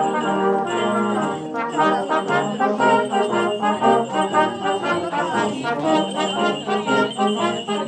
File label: the brass practice